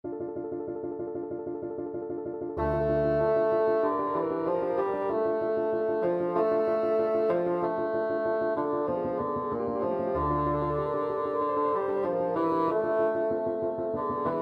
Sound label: scene finale, bassoon